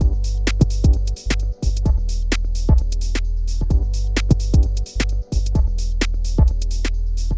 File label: Nice 2 step loop